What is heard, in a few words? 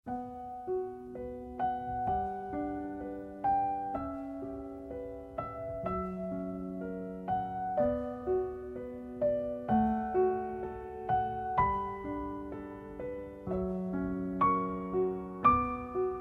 Piano Version